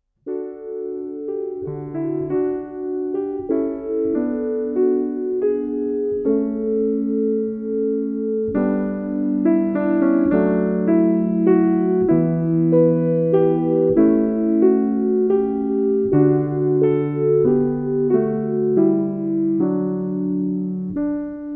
Prelude in D major second version, Keyboard Studies